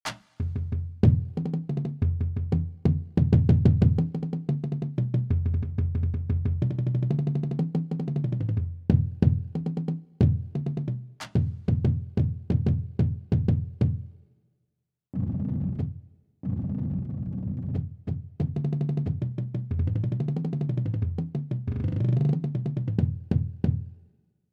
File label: Bass intro; ER Bass Excerpt #3 Mvt. III (Intro)